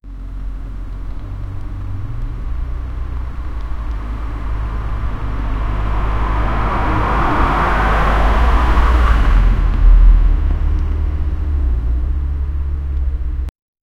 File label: sound of a car driving